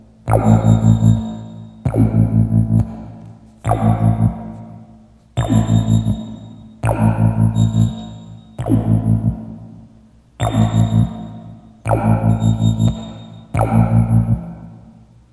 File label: bit crusher bass synth